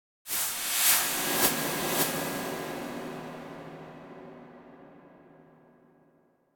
Cymbal Strike Brushes High Tone Whistle Impact - Triple; Hollywood Edge; sound designer's toolkit v2